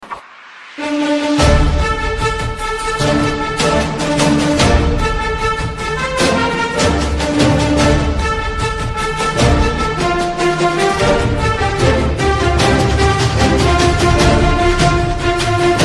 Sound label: bgm; sarkar